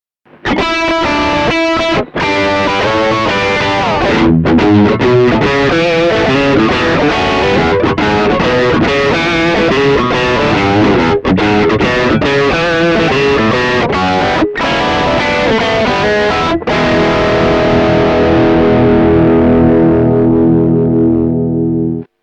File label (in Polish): Fen Przester S, classic strat single